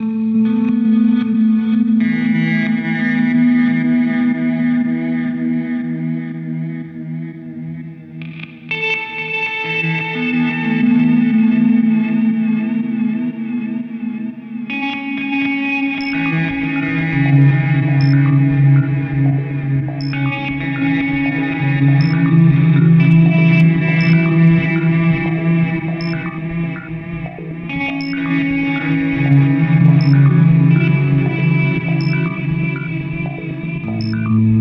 Delay Reverb Pedal; Minim

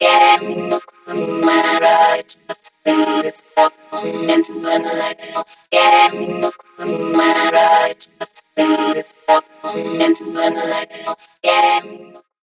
Orange Vocoder Mixed Synth Filter LFO Freeze; Zynaptiq

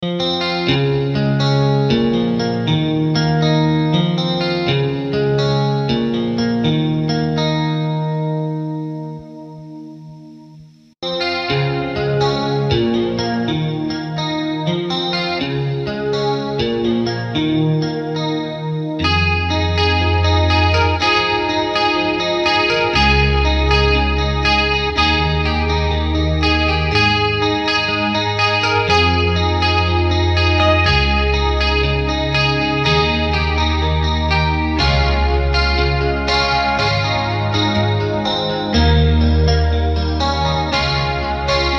Stratsound Medium Chorus; Boss CE-1

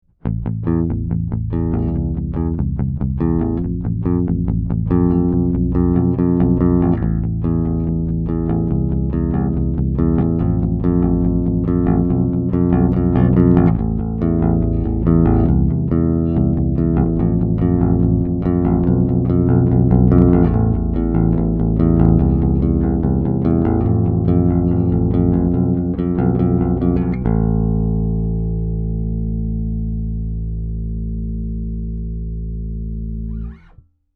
GAP Bass

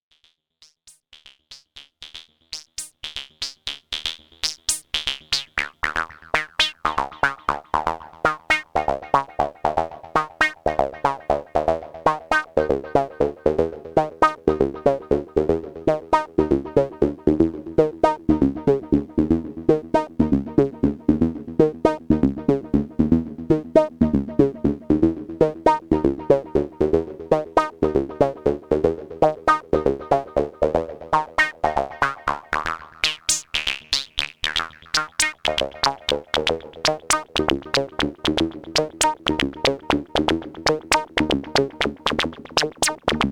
wasp fx Band Pass Sequ

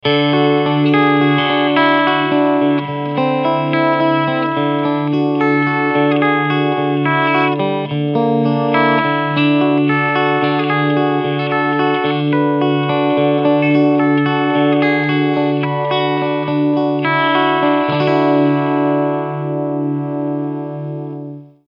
Mode Vintage Amp Clean

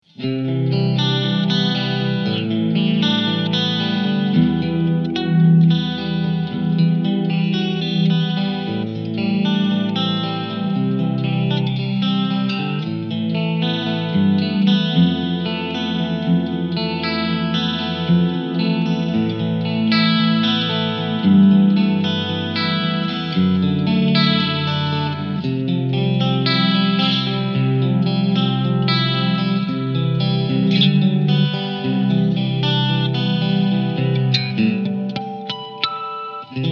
Bright Clean Chorus Reverb; Blackstar ID Core 40 V3

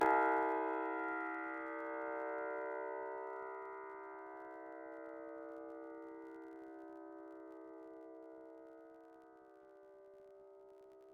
hipass out raw

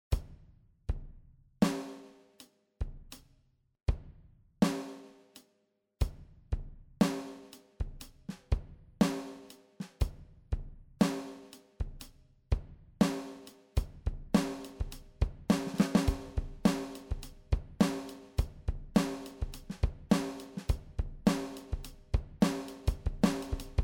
MOOER Drummer Speed Settings Stereo Out

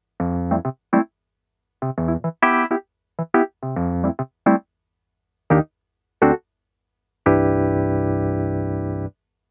Casio PX-S3100 60s Amp Piano

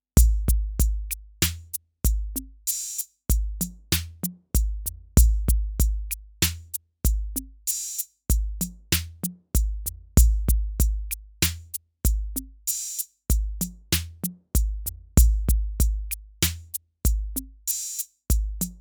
Loop RD-8

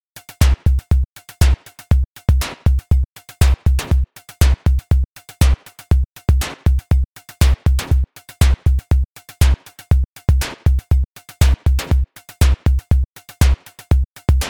FM Beats

